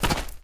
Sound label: dirt land, footsteps; chief